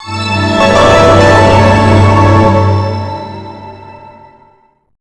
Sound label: win; PSO音源